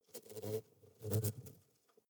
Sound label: hornet hum